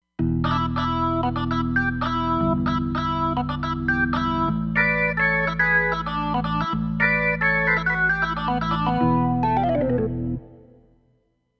Rocky Organ, Roland Fantom XR

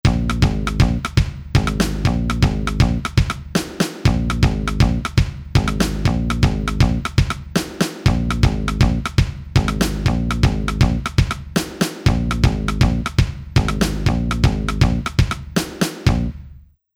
Fusion

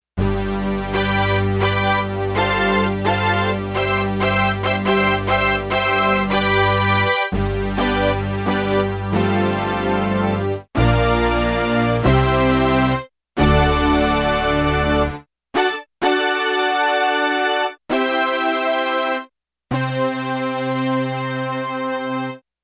Brass Stabs; Digital Synthesizer

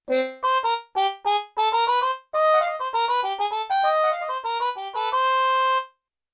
Mut Trumpet + Band; Yamaha TX16W; Big Band Performance

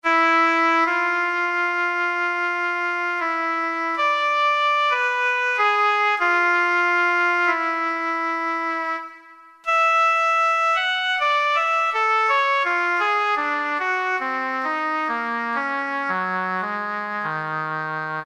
Oboe, Roland PN-D50-01

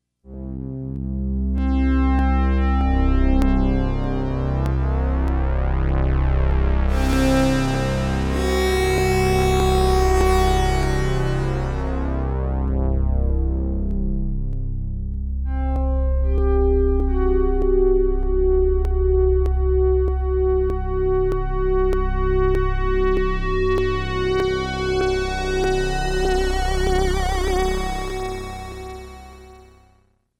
Filter Drone

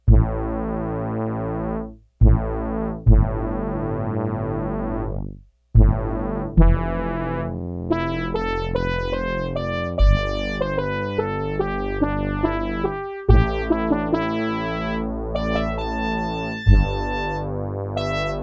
BRASS SYNTH SOUNDS

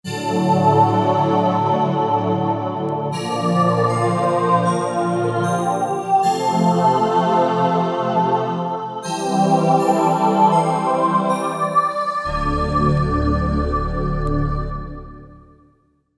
Vocal Pad

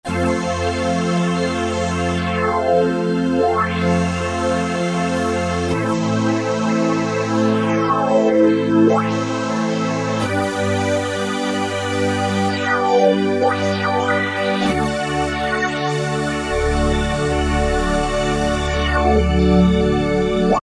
synth-Raunch; E-mu Orbit V2; Factory presets

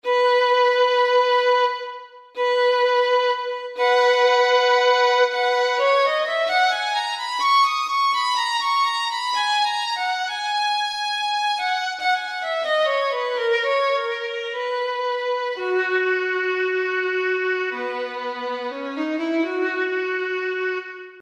Solo Vln, Roland SR-JV80-02 Orchestral

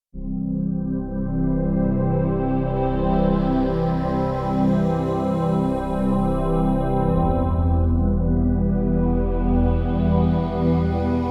Drama Pad, Korg Radias-R

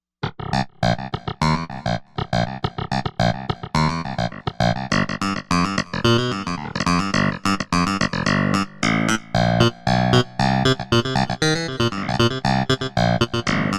Pf Stereo Clav

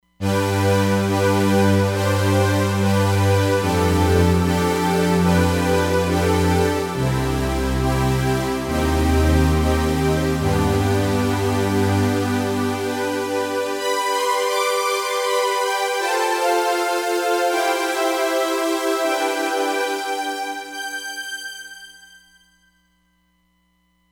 Super Syn Str, Roland Fantom XR

